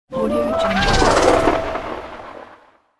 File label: reanimate fruit spell